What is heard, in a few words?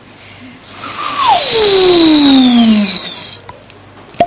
tube flute; tubes; you can also hear the popping sound at the end when you pull it out